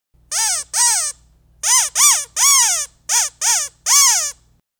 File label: SMALL, squeaker mouth doublevoice; squeaker mouth doublevoice small 12 pack trick